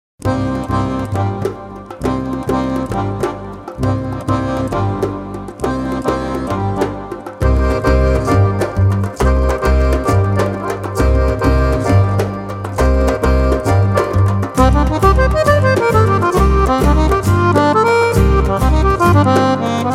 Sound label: ethnic jazz